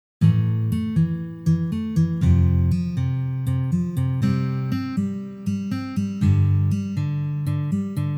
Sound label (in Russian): Peremennyj shtrih